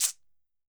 Roland MV8800 percussion

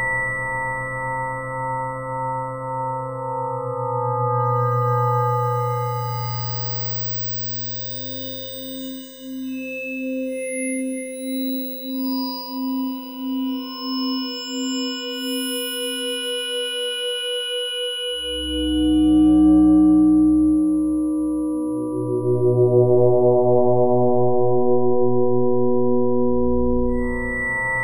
SLOW FM; Bolder Sounds - Granular

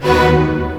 HIT, synths; Rubber Chicken Software Sample Bank

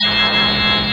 CHORD L, Drum & Bass 160 BPM; Ueberschall; Drum & Bass Flash Trackz

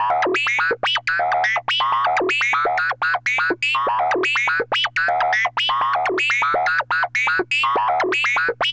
NOISE, SYNTH SEQ; AMG; Global Trance Mission VOL-2

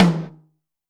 tom, Zoom MRT-3B

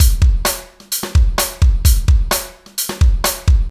DUDE JAM 129, LOOPS

